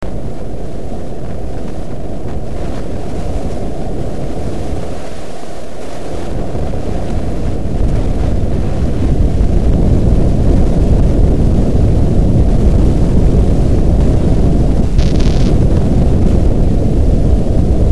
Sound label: wire hiss sketch